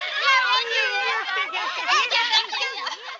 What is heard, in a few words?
kids; Virtual Pool Tournament Edition